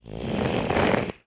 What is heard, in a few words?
human 3 year old boy sniff snotty